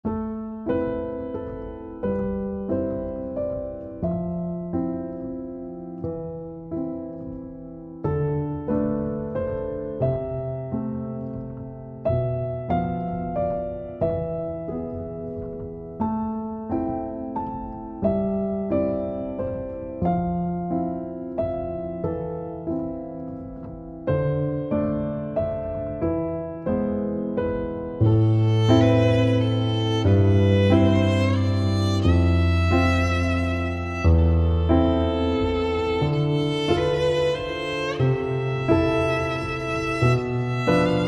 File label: Sweeping Waltz, No Synths